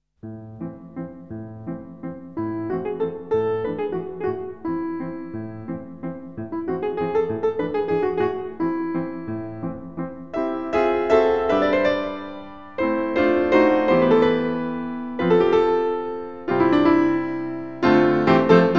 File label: piano